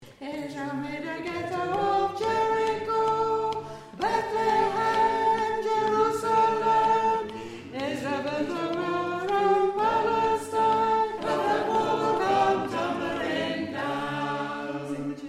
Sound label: tenor